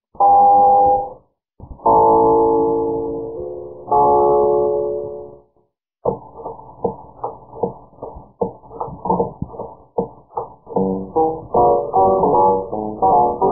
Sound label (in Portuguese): bateria